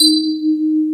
Electric Pianos; Wizoo Electric Pianos

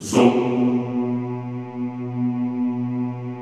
ZOOMMM B1, Classical Choir; Best Service; Extended Classical Choir

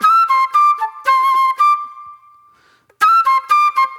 Soul Plate Flute

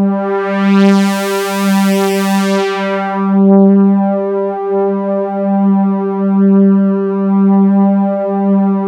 full analog; Sound Gallery - Charged

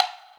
Wood, Block Stick; Equipped Music; Loungin House

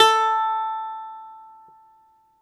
BALALAIKA, Prima Balalaika, Plucked Strings

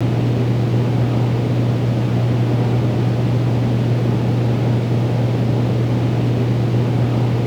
room tone; E-MU Producer Series Vol. 3 – Hollywood Sound Effects; Ambient Sounds; Room Tones

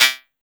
PERC, Drum & Bass, 160 BPM

